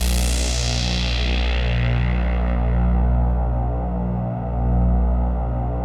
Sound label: ATMO PADS; Best Service; XX Large